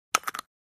Bone Break

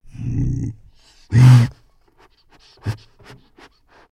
Bobcat Snarl Low Grun; big cats

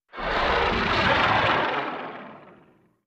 Dinosaur Growls

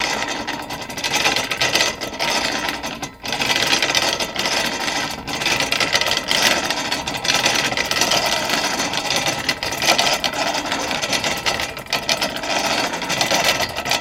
Lawnmower Going Bac

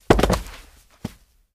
Body Falls On Carpet

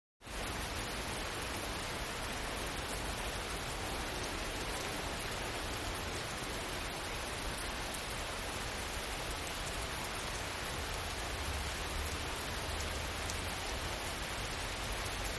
Rain Medium Distant T, wet traffic

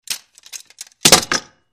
Set Down Sword Sword S, swords

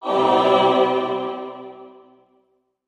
Music Effect Choir, choirs; Short Female Choir Chords

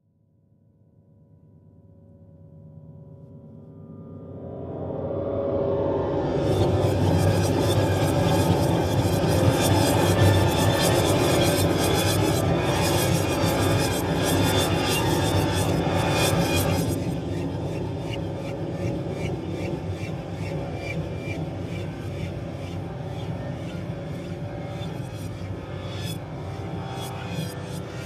Horror Grand Piano Effe; eerie ambience

